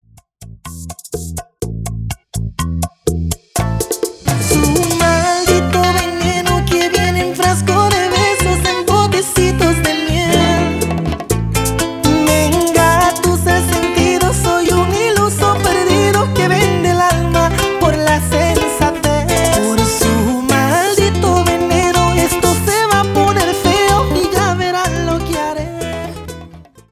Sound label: Intro Chorus 124 Bpm